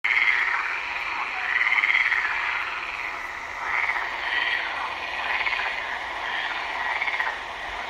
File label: Frogs